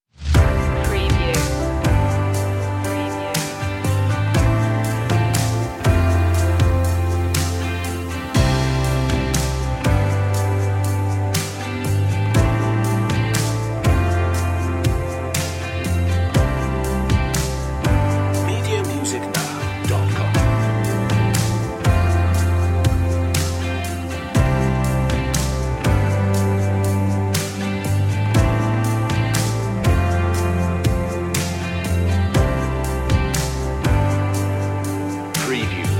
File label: no euphonium, pop ballad